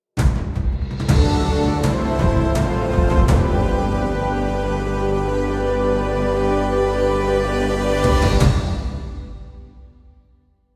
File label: Win Stinger; Axon TD Uprising Tower Defense